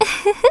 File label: Giggle; Princess Styla giggling